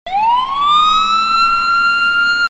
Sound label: police